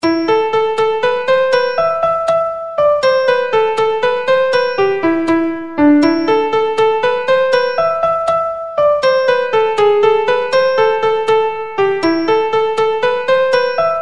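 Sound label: Coleraine Jig, Traditional Irish Music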